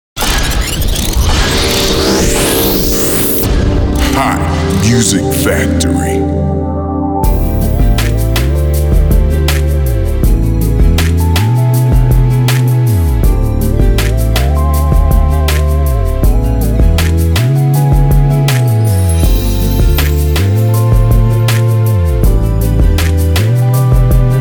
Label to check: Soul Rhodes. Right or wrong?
Right